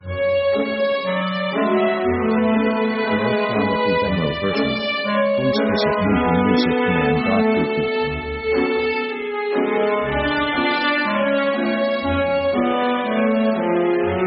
orchestra italian marching sad; orchestra themes

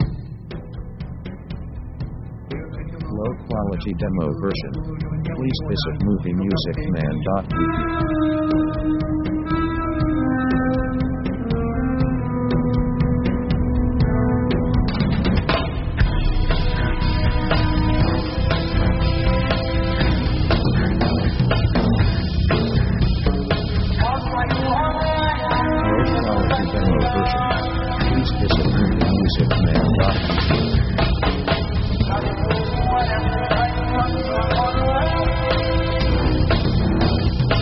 orchestra; Dark mood

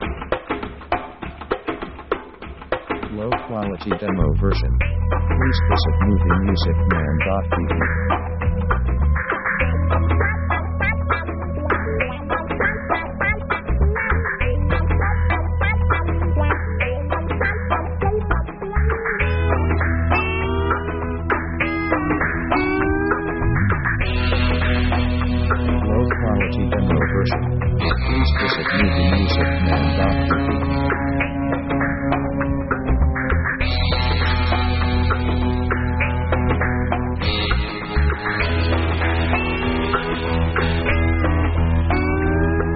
wild west, funny

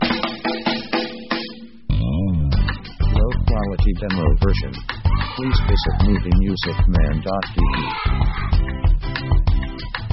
african sunrise

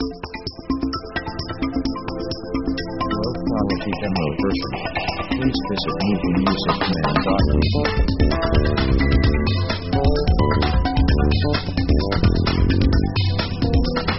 expanding space, synth

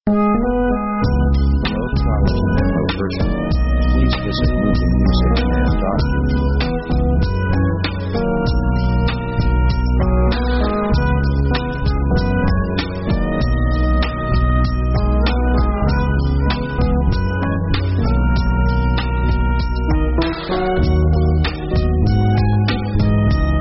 pop motown slow; Basically slow

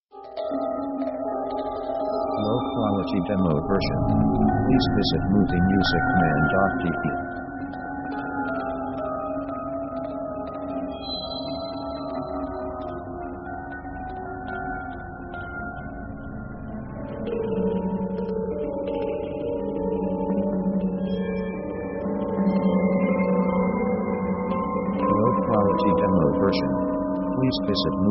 atmo mysterious; Background themes

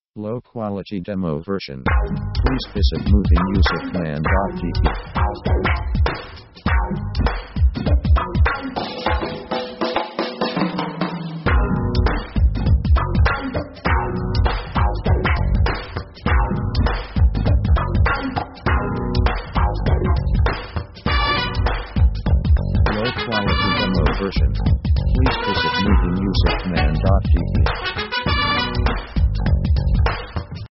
pop heavy downbeat funk; power themes

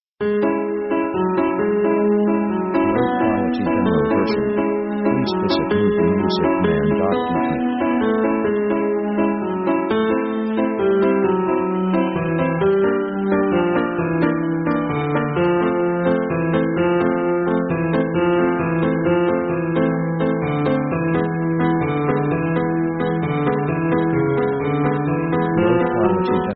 orc destiny progress; orchestra themes

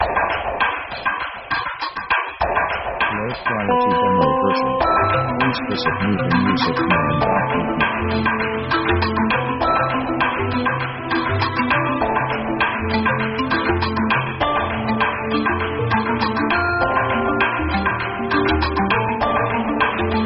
flowing 70s major modulation; synth themes

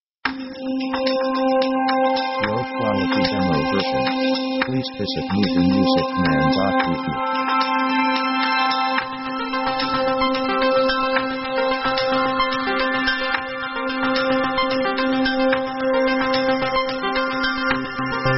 smooth background uplift; synth themes

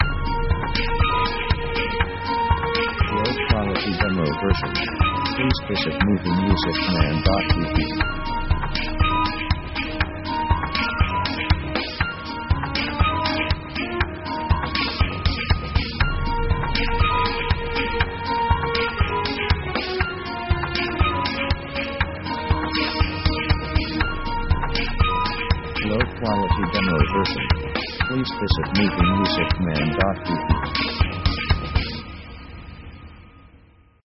orchestra funny sketch

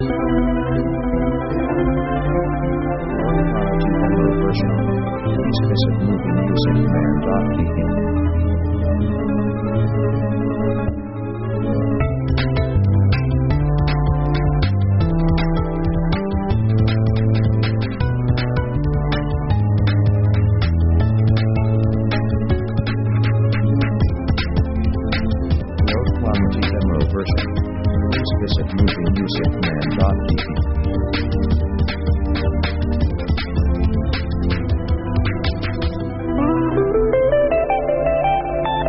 jungle strings; synth themes

